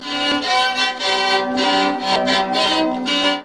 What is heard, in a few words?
Crwth